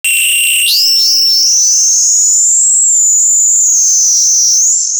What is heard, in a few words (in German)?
PFEIFFER; JUST FOR FX